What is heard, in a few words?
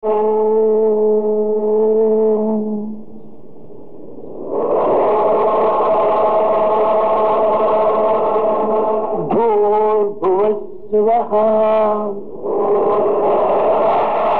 gayatri coro